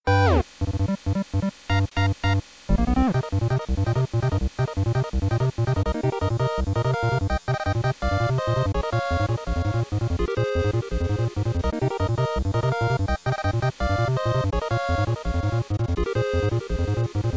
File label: Boss Battle Theme; KSS